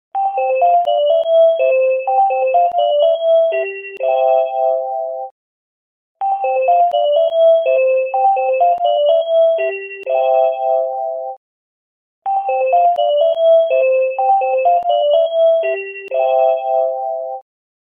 Iphone Easy Sound

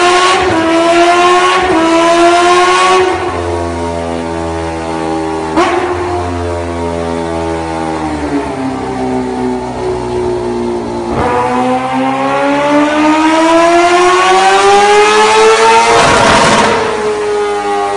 Kawasaki H2R Dynorun

